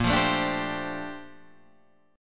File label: A sharp Major 13th, Guitar Standard; A#M13; strummed; JGuitar